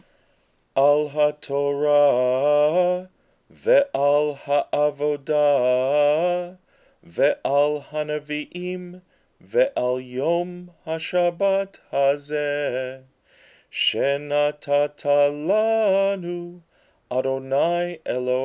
Haftarah Blessing Chanted After Reading the Haftar; bnei mitzvah